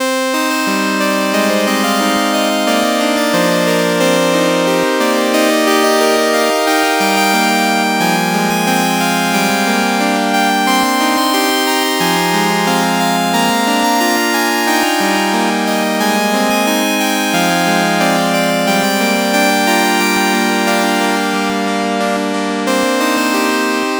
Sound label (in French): clavecin